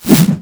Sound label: arrow near miss; weapons